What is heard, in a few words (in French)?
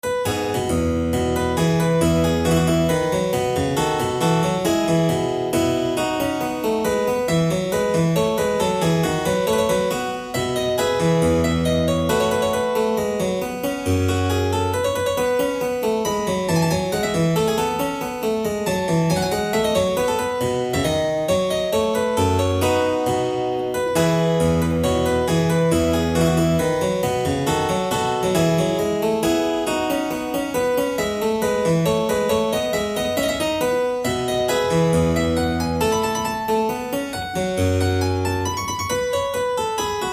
allegro clavecino